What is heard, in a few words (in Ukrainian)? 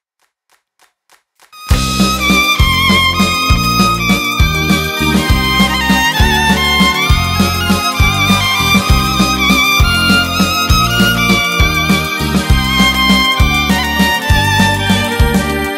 cover наживо, Ketron Audya5, Yamaha MODX7; Ketron Event